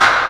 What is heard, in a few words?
PRC, Clap Modules; Roland L-CDX-01; Clap & Snap